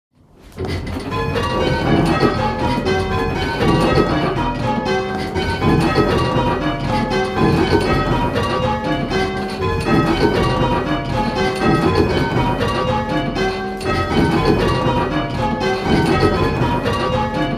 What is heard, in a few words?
Penta Stedman Triples, bells; 8-Bells